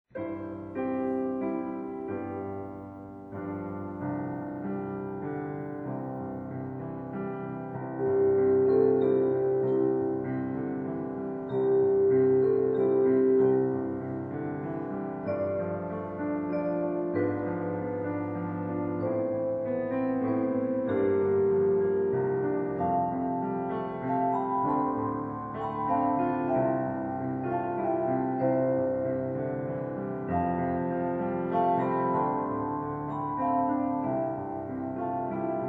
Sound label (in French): Sainte nuit instrumental